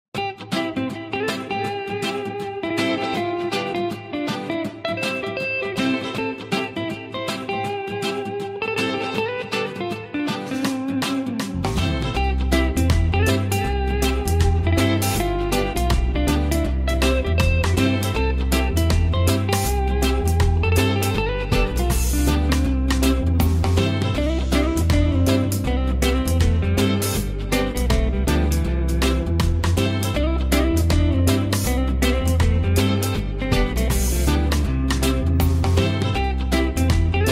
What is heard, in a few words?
alarm morning flower reggae version; Samsung S4